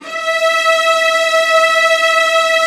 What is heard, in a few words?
VIOLAS F#5, Strings; ILIO; Synclavier Strings